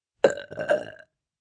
Burp Low, Burp, 1 child, low, dramatic, human voice, kid